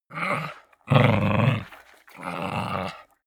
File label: chihuahua snarl fight soft